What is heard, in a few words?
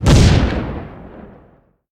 Bomb Explode; We Are The Dwarves; Fishmen